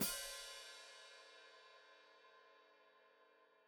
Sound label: Hat Closed Open, Hi-Hat